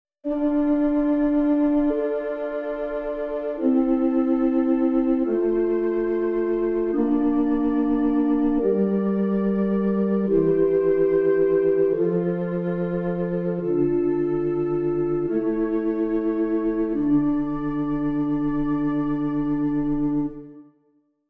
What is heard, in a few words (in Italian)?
Esercizi di contrappunto a tre voci